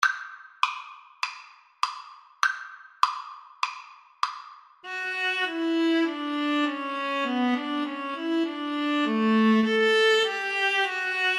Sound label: schumann melody, Viola Cello Duet